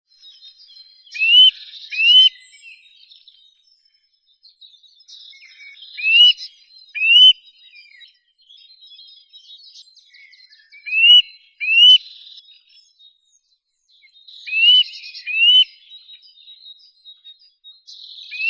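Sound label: Eurasian nuthatch, Sitta europaea; popolzen obiknovennii; zvuki prirodi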